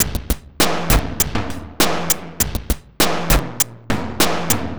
Noise Beat; Drums & Drones

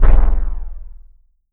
biconvex bass, Analog & Synth techno; USB Soundscan vol.12; AKAI

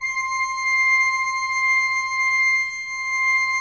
16-8 C6, Cathedral Organ; Propeller Island

